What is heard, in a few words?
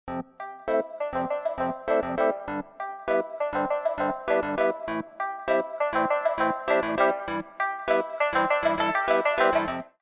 round ten sec count; music kits custom